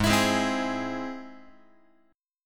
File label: Ab Augmented Ab, Guitar, 4A, 4E, 5A, 5D, 5G sharp, 6C sharp; Ab+ strummed; JGuitar